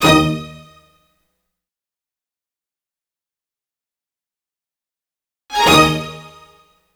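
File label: E-Coast Hip Hop String Hit, Ebmin, 085 bpm; Pro Tools Essential Loops; Digidesign; Strings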